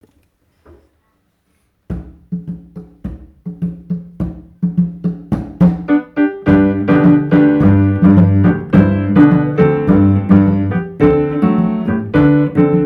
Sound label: Cello; African Marketplace